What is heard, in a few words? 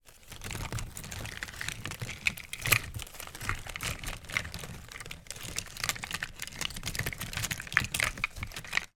office rummaging through stationery in small drawer